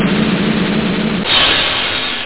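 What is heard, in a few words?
drum roll; Master Clips Email Animator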